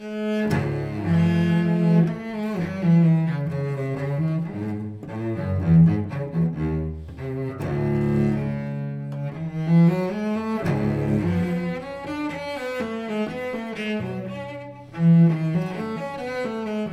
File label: bach allemande suite nr 2